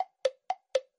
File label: clock final 5 sec loop; Bunnicula - Juicy Bites